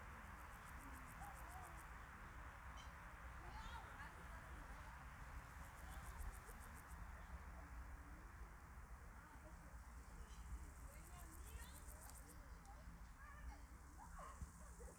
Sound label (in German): parallelus, hintergrund Biguttulus